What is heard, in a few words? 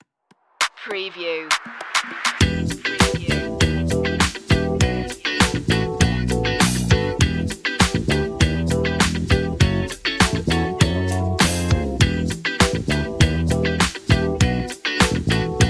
good vibe